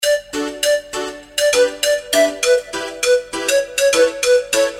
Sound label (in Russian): sms korotkaya melodiya dlya smski